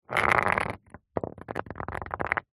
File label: stone scrape drag concrete; foley; zapsplat